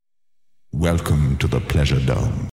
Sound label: Vocal